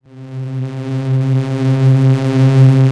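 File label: laser power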